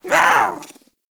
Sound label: Pet Speak; Warframe; Catbrow; PetShip